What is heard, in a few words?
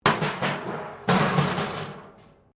metal rubbish bin smash concrete; Materials